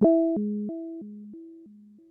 SYNTH Blocky Grazzz One Note

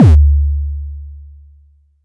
Tom; TRX

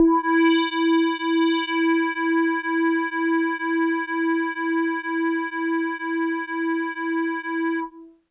Hologram Kawaii E3; keys

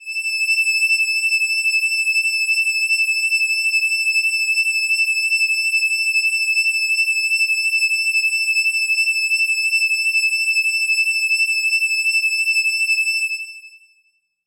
Analog Strings Kawaii E6; pads

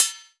METAL Copper, perc

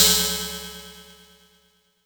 Cymbal FX

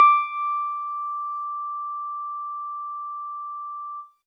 E Acoustic DX100 D5

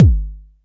BD; TRX

